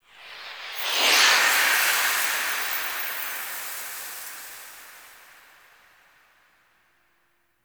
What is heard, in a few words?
Portal; Ballas Lotus Operator Intro; Warframe; The New War; Cinematics